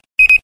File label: beep twice